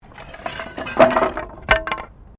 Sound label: Wood Move; Material components for constructing more complex sounds; Wood (Sound Effects:Materials)